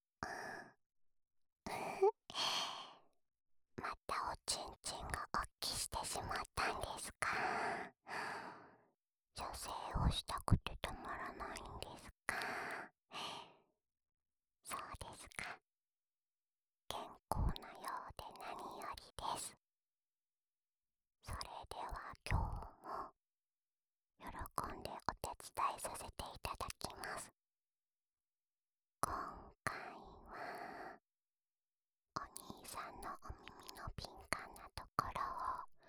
耳舐めループ（右耳）; オナサポ用

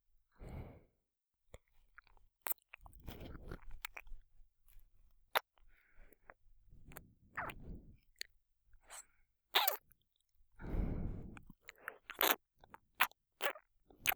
右の耳舐め ループ仕様; クーデレ少年と耳舐め

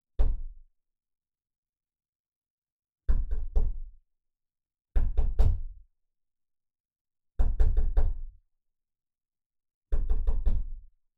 木製ドアどんどん; KU100; 色々な動作

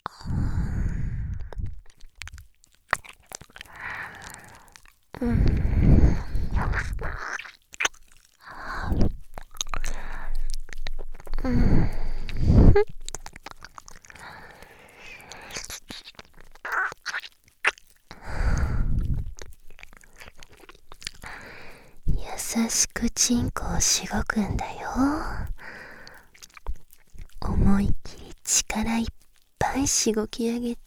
耳舐め 右耳; 効果音有り

